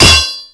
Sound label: knife hitwall; cstrike; Grean knife